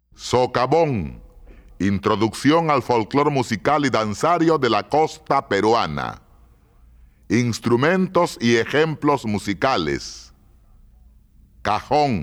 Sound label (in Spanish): instrumentos musicales